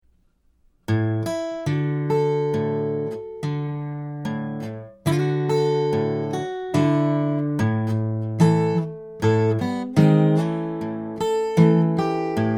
Monday morning blues slow

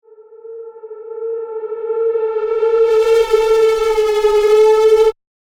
black distort rise

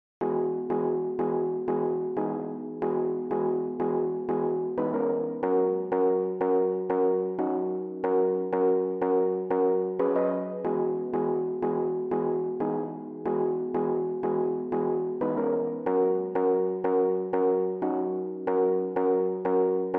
Chord Section

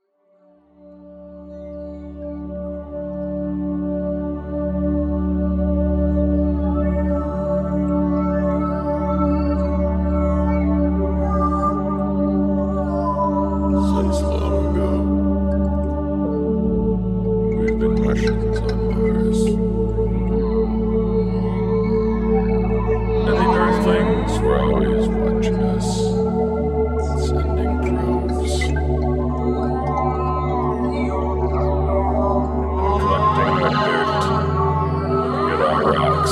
Prelude, Folk Hymn; Martian Folk Hymn

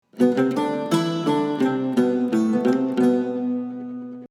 Sonic Logo

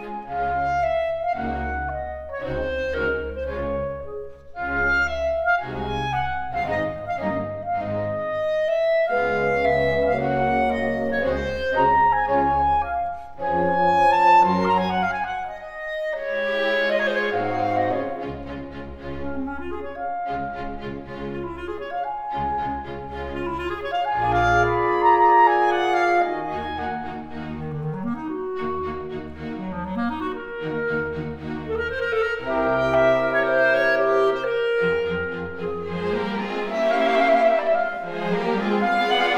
Clarinet Concerto in E-Flat Major, Allegro

